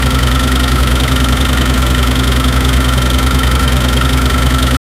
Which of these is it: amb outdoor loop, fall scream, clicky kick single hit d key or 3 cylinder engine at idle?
3 cylinder engine at idle